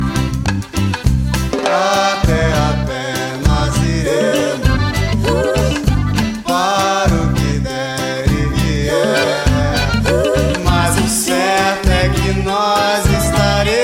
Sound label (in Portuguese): Hino